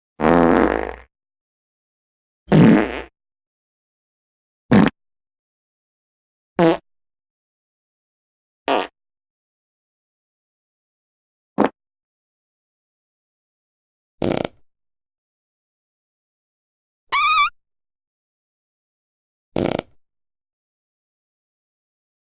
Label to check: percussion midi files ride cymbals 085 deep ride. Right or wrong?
Wrong